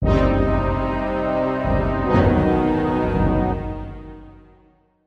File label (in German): Mission Fehlgeschlagen; Port Royale